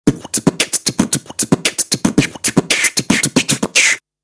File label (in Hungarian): effekt szajdob